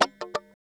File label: CHUCK KUH, FUNK CHOPS, guitar; Spectrasonics; Hans Zimmer Guitars VOL-1